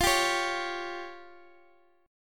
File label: F Suspended 2nd Sharp 5th F down